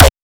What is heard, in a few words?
hardcore kick